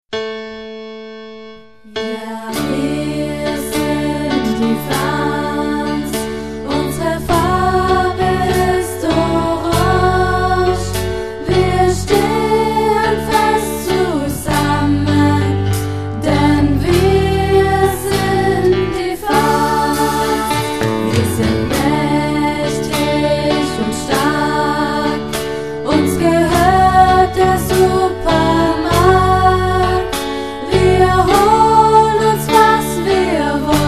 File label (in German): Hymne